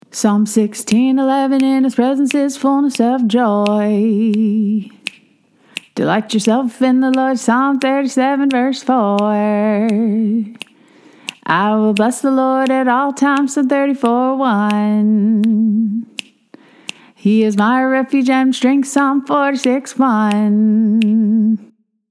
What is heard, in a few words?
Psalm Raw